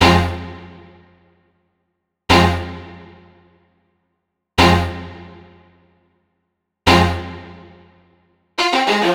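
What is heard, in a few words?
105 Hip Hop Strings F major; Pro Tools Essential Loops; Digidesign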